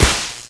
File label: tazor shoot shock